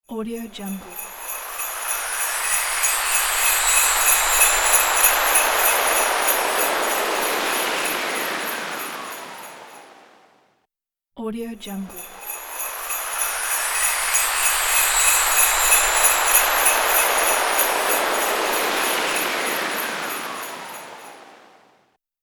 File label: christmas wind